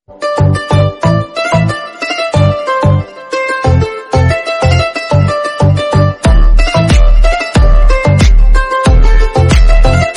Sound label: instrumental hindi song tone ringtone, bollywood ringtones